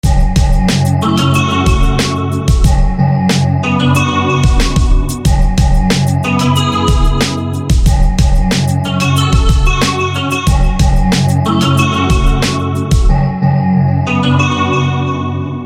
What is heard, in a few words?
Guitar; Hollowburn; SFB FX